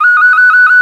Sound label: Ocarina; Planet Earth; Digital Sound Factory Planet Earth